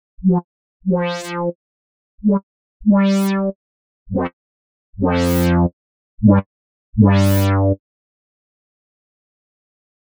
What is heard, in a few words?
Octaver Bass